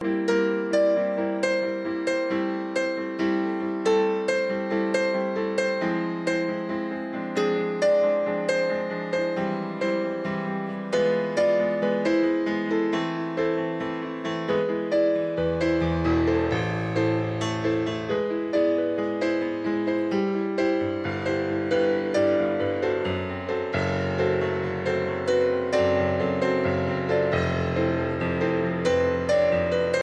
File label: Marco Solo